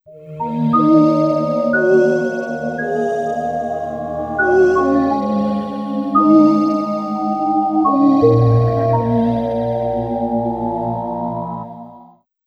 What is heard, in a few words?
Ambient Keys